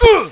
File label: GRUNT M; ROADRASH